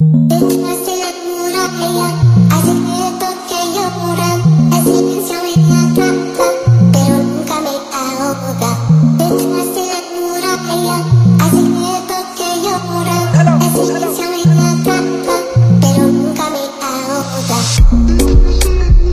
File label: 108bpm A minor, beats